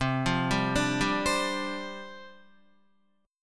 C Suspended 2nd Suspended 4th, down, Guitar; Csus2sus4; arpeggiated; JGuitar

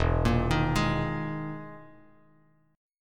E-Major Sharp 11th E, Bass Standard; EM#11 arpeggiated; JGuitar

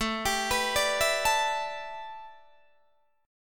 A 9th Suspended 4th Guitar Open D, chord

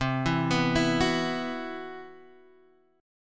B Suspended 4th C, chord; arpeggiated; Bsus4; JGuitar

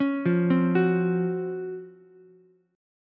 Db Major 11th Db Ukulele C6; arpeggiated; chord shape; 6,5,8,9; JGuitar

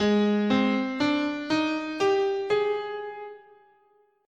Ab Chinese

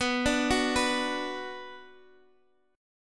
B Minor B Guitar Standard; x,14,12,11,12,x; Bm arpeggiated; capo=3

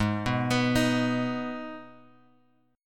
G sharp Minor G sharp, chord; G#m Chord {4 2 X 4 4 X} arpeggiated

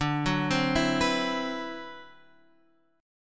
D 13th D, down, Guitar, Drop D

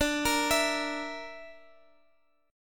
D Suspended 2nd Sharp 5th D, Guitar, Open G

